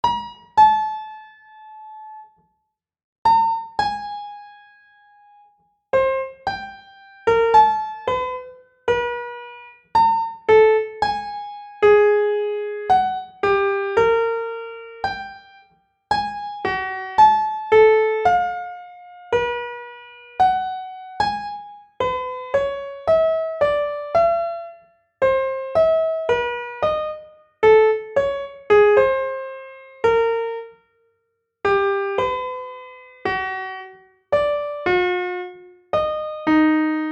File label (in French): trompette 2 voies nonoctave 84,46 cents 56 bpm